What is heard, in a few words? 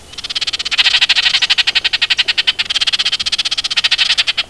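oriole balt call, Baltimore oriole call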